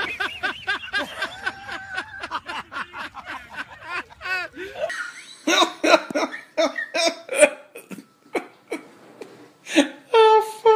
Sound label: Contagious Laughter Compilation